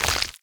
skeleton hit; wesnoth

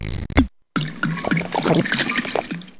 potion; wesnoth

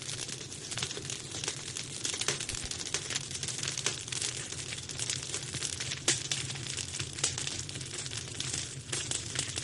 campfire, ambient; wesnoth

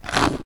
bite small; wesnoth

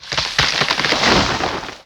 wose die; wesnoth

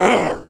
orc small hit; wesnoth

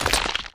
skeleton big hit; wesnoth

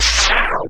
magic dark miss; wesnoth

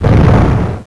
fire; wesnoth

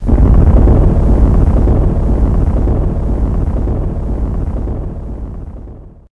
rumble; wesnoth

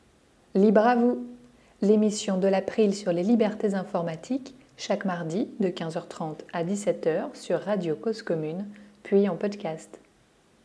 jingle

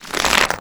petrified; wesnoth

